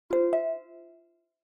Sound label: Smartphone UI Sound Dry